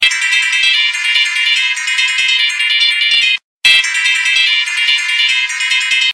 Phone Ringtone; Kaixa

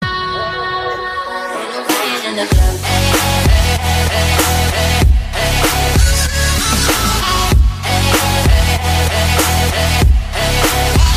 remix

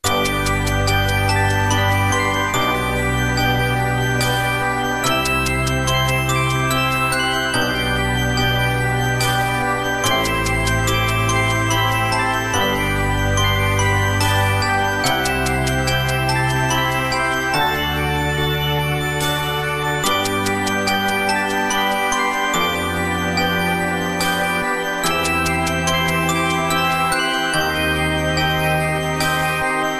minuet

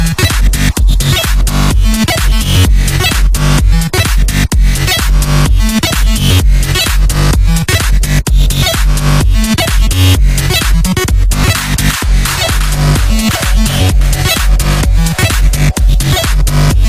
electronic switch

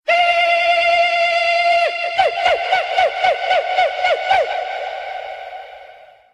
Chant

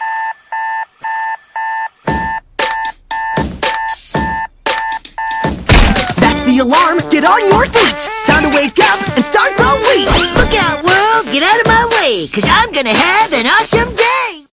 Wake Up Song

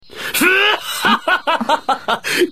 Mad Laughter

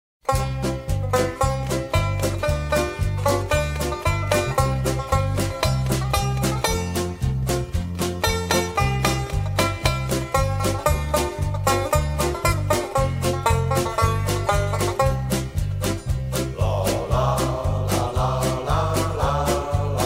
Country

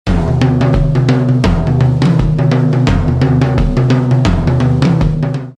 DRUMS

